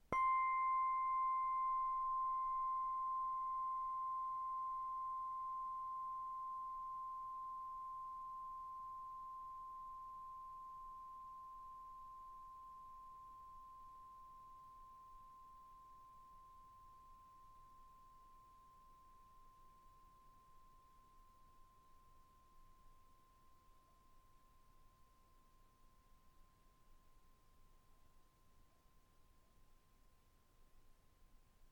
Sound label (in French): Cloche plate C6